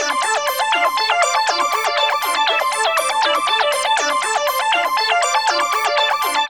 trippy sequence D 120

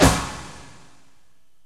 STACKED PERC

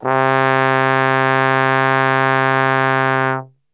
C 2 Brass Super Section; Best Service; TRMBSTRGHT; 90 s Sample CDs

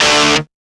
old skool stab

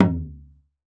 Bebarongan, Percussion; Roland BK-5

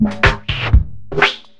FILTER FILL

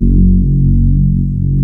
CMI ORGAN

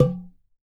oxaca air, percussion; ILIO

